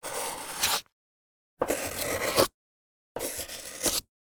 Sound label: pencil